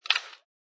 Baseball Wood Hit

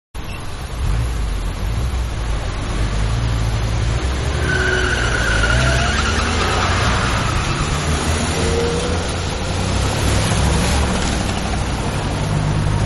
Intersection Ambience Town; Cities; Backgrounds; Manhattan Production Music

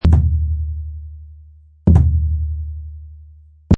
Low Hand Drum Beat 130

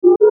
Mouseover Alarm Multiple Tone Ascend; Multimedia; Blastwave

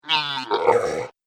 Orc Constipated; Monster Sound Effects; horror sound effects

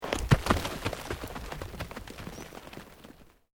ROCK TUMBLING; Material components for constructing more complex sounds; Rocks; Materials

